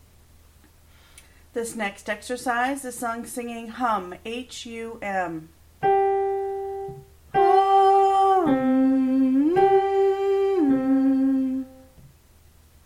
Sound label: vocal hum, male warmups